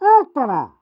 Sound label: positive vocalization, fr; Pyro